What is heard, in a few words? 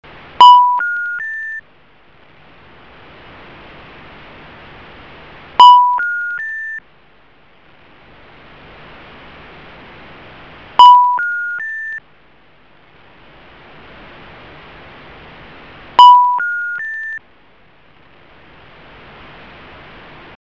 phone